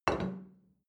plate place table soft short, gastronomie; berufe; soundpool

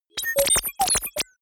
confirm glitch, signale; soundpool

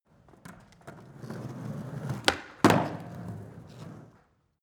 skateboard indoors jump; sport freizeit

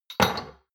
shot glasses place multiple hard, gastronomie; berufe; soundpool

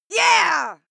female fight attack shout yeah

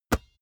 basketball catch hard

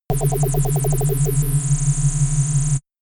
shot ringing wobble low; pulsieren

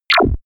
laser gun empty ammo; krieg-waffen; soundpool

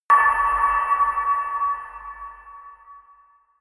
shimmering metal; hits explosionen; metall

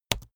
keyboard laptop enter press fast hard; kommunikation; computer

